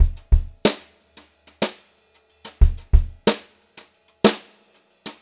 Brushed Drum Loops; USB Soundscan vol.39; AKAI

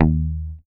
HOFNER MUTE, Bass

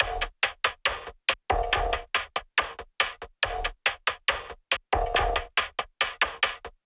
SloMo Beats, Loops 140 BPM

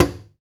DUMBEK; Roland - LA Composer vol. 1

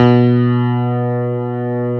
CP-80, Yamaha CP-80; Greytsounds VOL-1

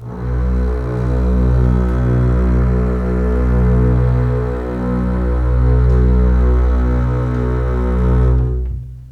LEG MF R, The Ultimate String Collection; East West

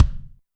kick, Zoom MRT-3B

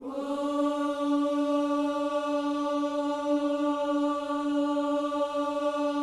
OOS D, Vocal Gospel

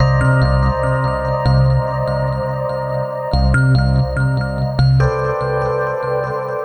NRG Pad; Pads; Trance; Sonic Foundry (Sony Creative Software); Trance NRG VOL-1

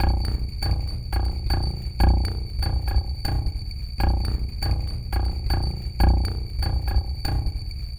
Glitched, Processed Percussion

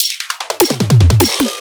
150BPM Drum Fill; Antidote Advent; Infected Track Drums Ableton Project

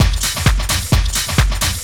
130 tribal, LOOP PERC TRIBAL; Techno Trance; DRUMLOOPS; Ueberschall - Techno Trance Essentials

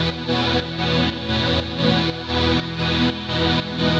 Pad 120 E, sidechained samples 120bpm

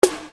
Snare Dr

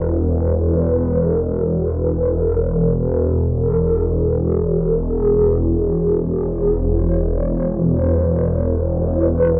100 E Drone Growling; Zero-G - Future Wave; Drones

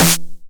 909 SD Tape Sat

